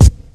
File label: acoustic kick drum c key